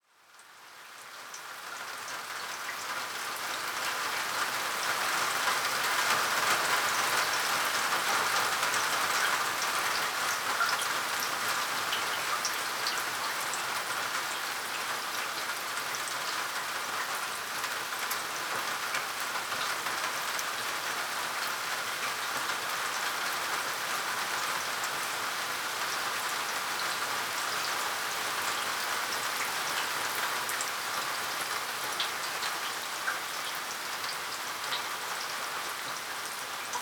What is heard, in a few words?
Quiet Meadow; Outside Broadcast Recordings